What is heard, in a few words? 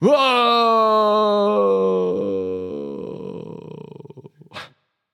fear; smite; raijin